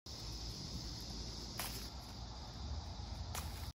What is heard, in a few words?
Picking fruit from tree; چیدن میوه از درخت